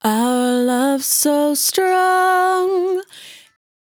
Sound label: 100bpm Bm, Velvet Vocal; Zero-G